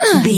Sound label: voice female effort quick action